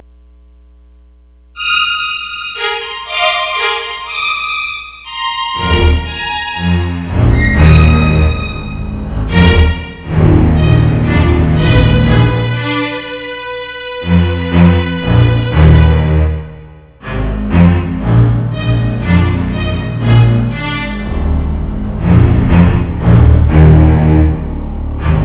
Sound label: quartet